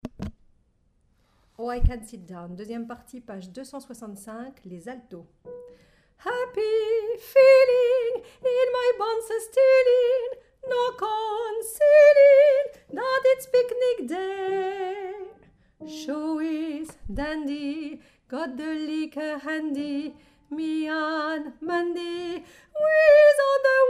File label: Alto